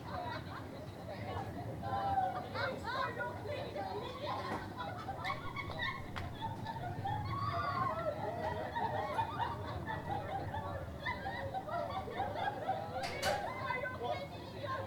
Neighbour Party

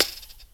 Air Compressor Valve

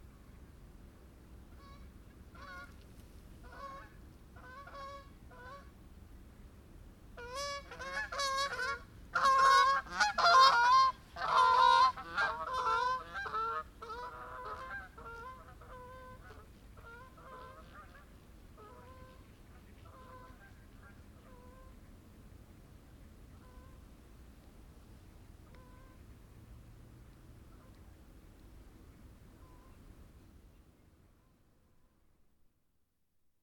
Canada Geese

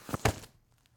Body Fall

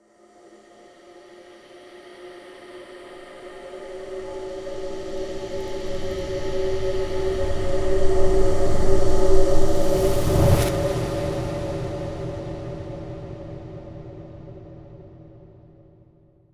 Tension Building Riser Whoosh

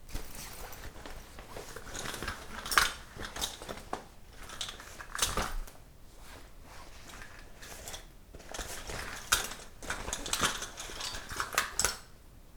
Purse Fidget

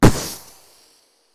Beatbox Explosion

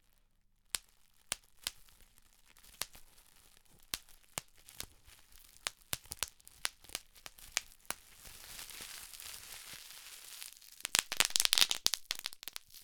Bubble Wrap Popping

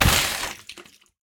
Gutsy Spillage

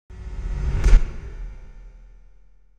Hits

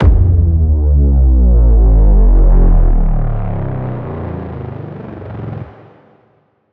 Downer Heavy Duty

